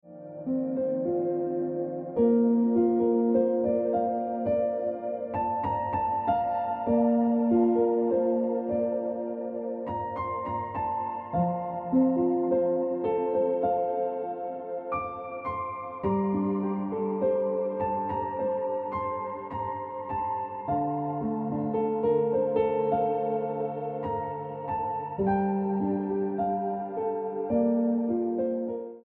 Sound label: Piano Music